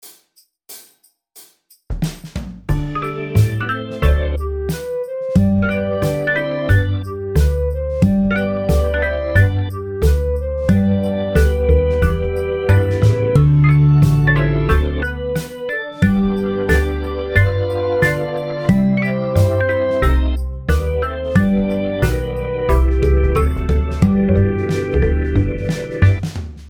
When The Saints Go Marching In guitar

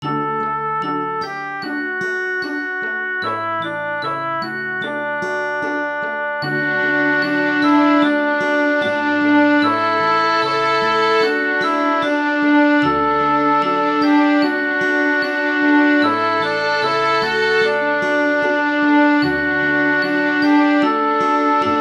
Lullaby violin and viola

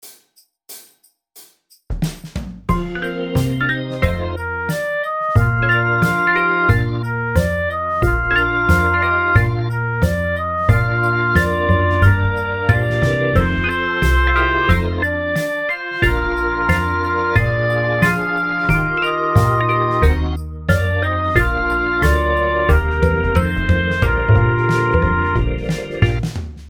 When The Saints Go Marching In Clarinet in B flat

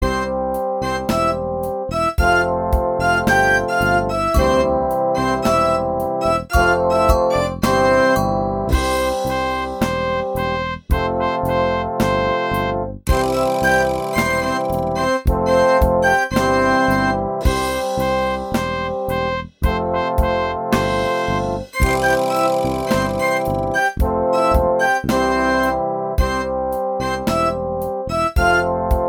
Dangerous Dangling D Practice Tempo B Flat

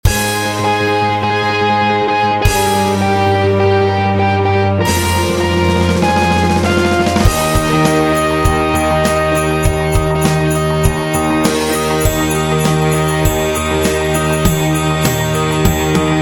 Jingle Bells E Flat

